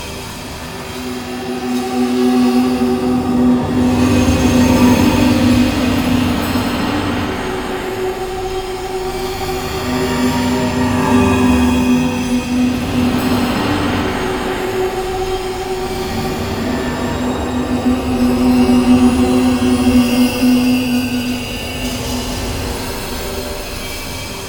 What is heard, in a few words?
Weapon Podium Loop; Warframe; Duviri; Ambience